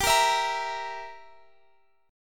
G Minor 6th, Guitar Half Step Down

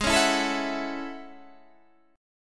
A Major 13th, guitar

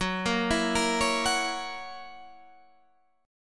F sharp 7th, guitar

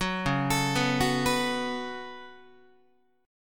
C sharp 7th Suspended 4th Sharp 5th, Guitar; C#7sus4#5; arpeggiated; JGuitar

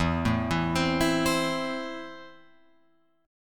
E Suspended 4th Sharp 5th, Guitar Open F; Esus4#5 arpeggiated; 4,4,4,7,7,7; Esus4#5 chord

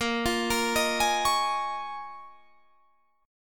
C sharp 6th Add 9th A sharp

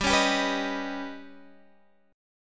D sharp Augmented 7th G

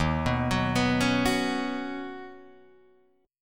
D sharp Minor 7th Sharp 5th, Guitar, Drop D

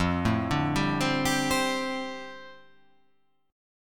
F 6th Add 9th F down, chord

